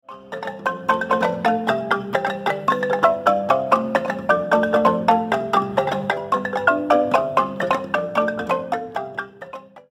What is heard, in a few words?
Marimba sound sample; Pacific Marimba; Porchfest2021